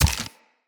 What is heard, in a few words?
Root Spider Mother Step Dirt